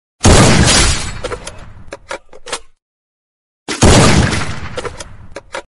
gun fire message tone

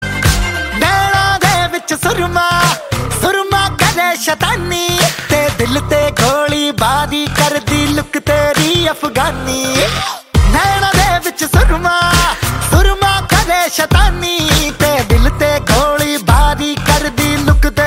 hindi song tone ringtone; bollywood

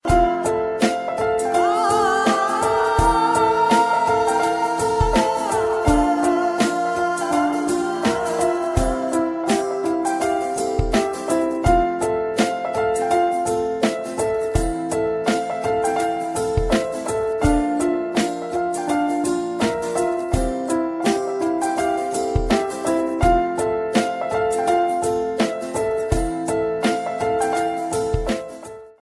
guitar ringtone

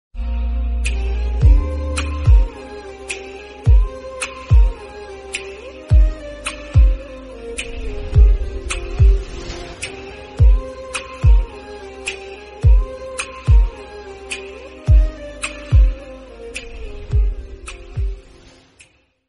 bollywood instrumental song tone ringtone

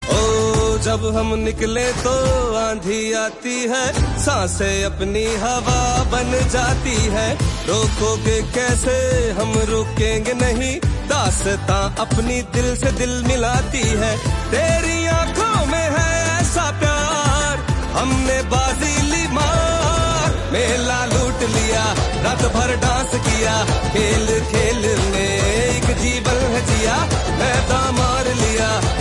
sports musical song tone ringtone

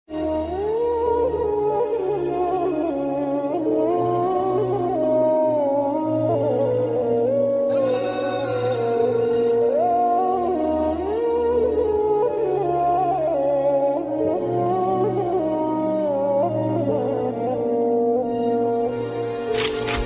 famous turkish music tone ringtone

